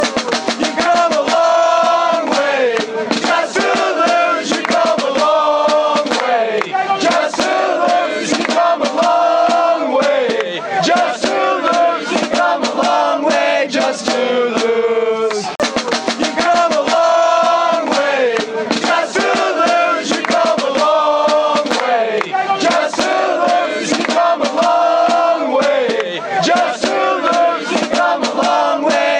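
youve come a long way just to lose, football chant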